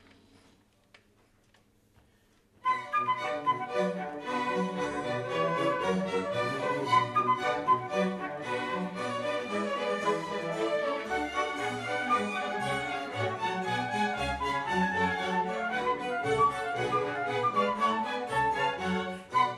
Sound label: badinerie